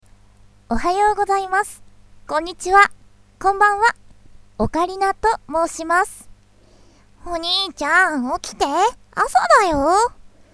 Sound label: ocarina